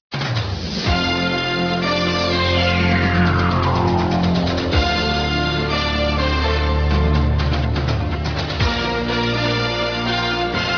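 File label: Brass Playing Mishaps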